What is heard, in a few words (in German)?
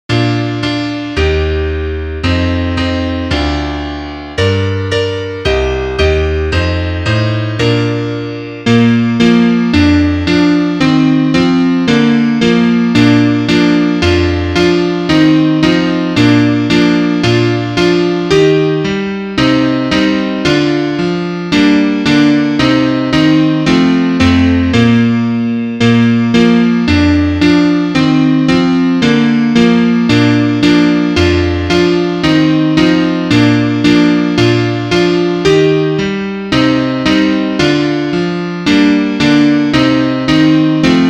Klavier; Liederbuch; taler